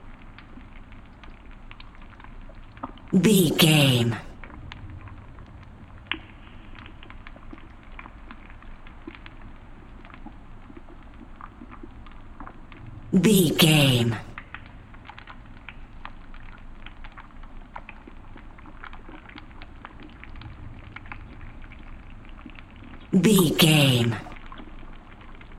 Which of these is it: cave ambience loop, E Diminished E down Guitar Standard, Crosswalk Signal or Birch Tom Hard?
cave ambience loop